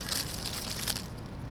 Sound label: Tree Rustle, percussion; Old Sixth Ward; Houston